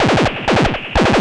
monodelay Fm 116, Recorded with monotron delay and monotron - analogue ribbon synthesizer; instruments; loops